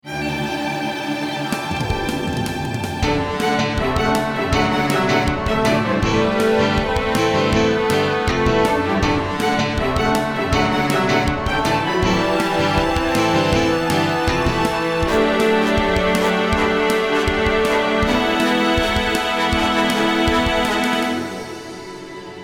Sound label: Chiptune